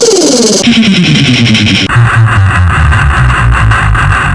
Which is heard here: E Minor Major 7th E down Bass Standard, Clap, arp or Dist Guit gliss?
Dist Guit gliss